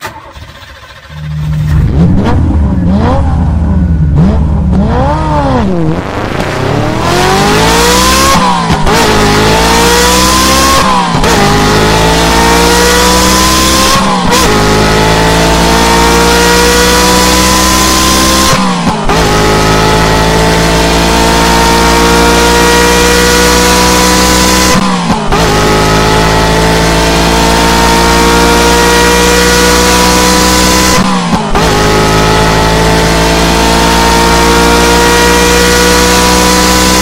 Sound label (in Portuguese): ronco carro